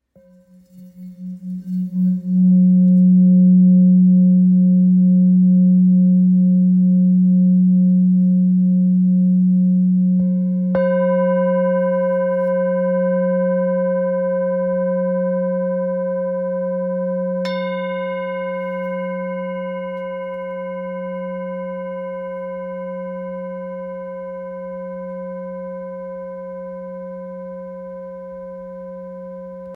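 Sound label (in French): bol full moon fa, bols chantants tibétains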